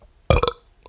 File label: Belch